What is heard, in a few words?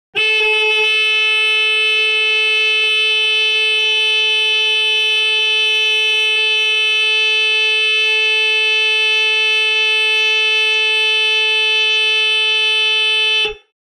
Horn Lorry Horn Long B, foreign